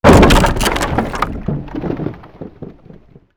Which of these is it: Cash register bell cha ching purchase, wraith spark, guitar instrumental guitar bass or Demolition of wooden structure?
Demolition of wooden structure